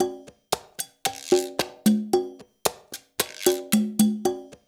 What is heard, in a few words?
LOOP, Percussion; InVision Interactive; Percussion Slam; ITCHY